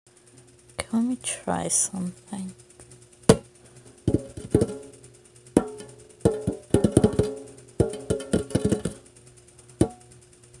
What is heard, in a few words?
Casual Bongos; Field Recording #4